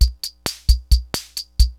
Univox loops; Rubber Chicken Software Sample Bank

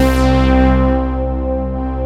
PULSE CHORD, TECHNO; Pro-Rec - Synthsonic; Z1 TECHNO

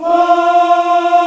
AFRO AH F 4, CHOIR AHS; Spectrasonics; Heart of Africa

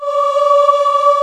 D#5 CHOIR MX

